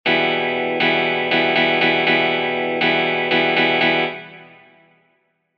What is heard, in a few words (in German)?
Schlagmuster